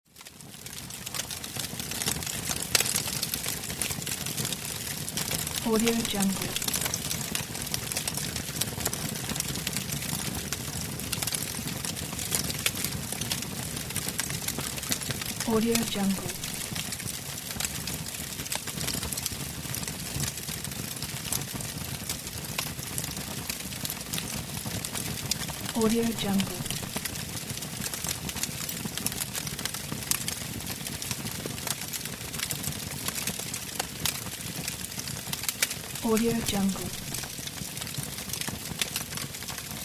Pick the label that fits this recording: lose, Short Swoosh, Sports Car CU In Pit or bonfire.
bonfire